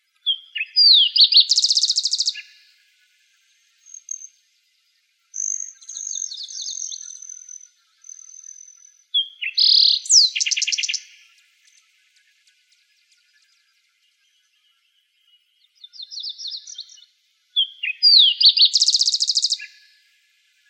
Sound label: Emberizids; GTTO; AZFO; deviche